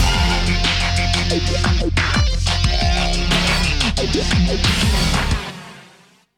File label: Lose